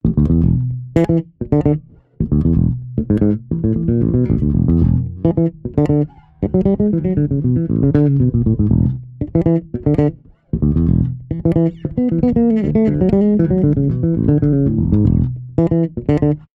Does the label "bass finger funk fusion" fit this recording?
Yes